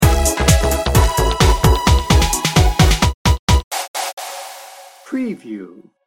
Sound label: happy techno sting bumper